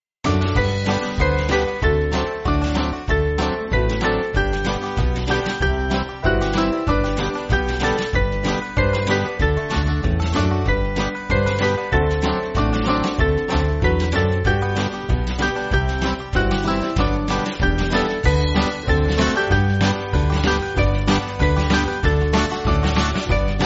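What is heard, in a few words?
Band, Backing Files for Churches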